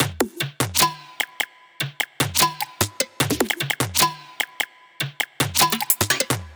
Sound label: Perc Loop - 150 BPM; Top; Future Bass; Drums